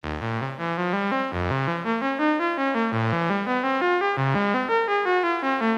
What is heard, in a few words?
dark fantasy